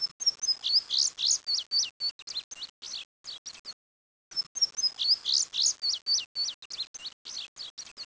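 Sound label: Lazuli Bunting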